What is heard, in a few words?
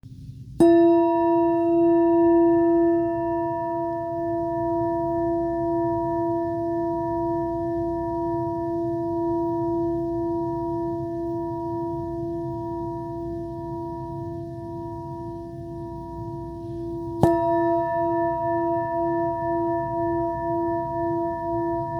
Tibetan handheld E, singing bowls; hand hammered tibetan singing bowl with handle